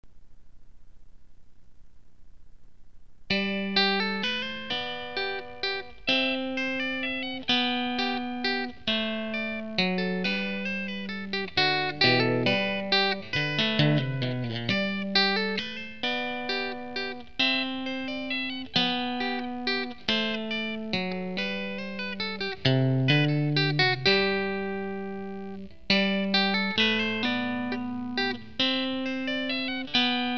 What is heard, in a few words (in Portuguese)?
minueto em G, guitar